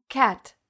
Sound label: cat; 5etools; bestiary